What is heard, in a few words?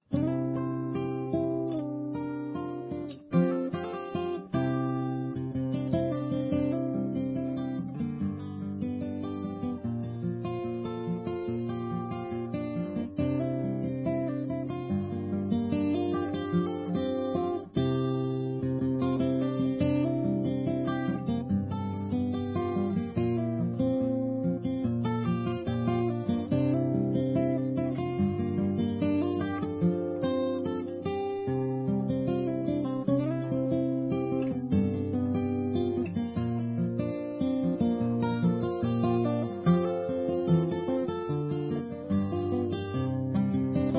Guitar Acoustic; Zomboid; Lifestyle